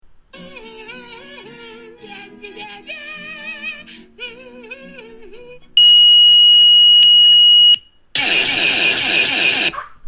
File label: beeeeper